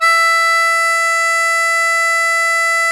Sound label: HARMONICA E4; Sonic Reality; American Heartland